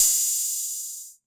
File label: Open hat, retro house samples, Drum Hits